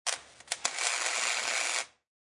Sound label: Welding: Industrial arc welder; Welders; Machines